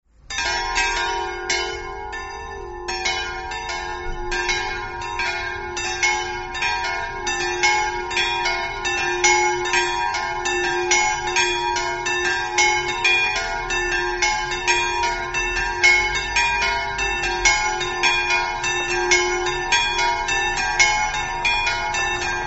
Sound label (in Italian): campane